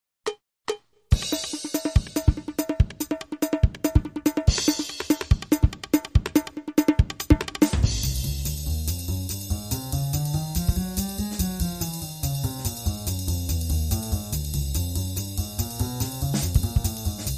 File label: Jazz Kit Loop